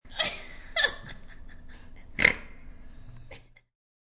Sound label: Snorting laugh; Laughter Sound Effects; Voice